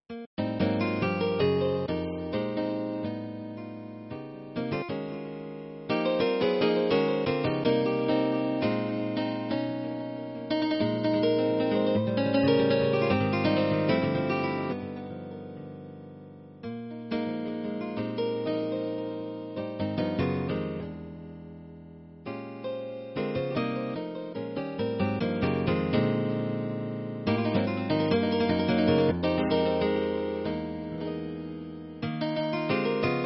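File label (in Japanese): 日本の童謡をJAZZっぽいｺｰﾄﾞ進行にしたら第5弾。前回と同じナイロンギター& 2倍速化。 ディレイとコーラスを少しかけています。; 日本の童謡D JAZZｺｰﾄﾞ風ゆうやけこやけの赤とんぼ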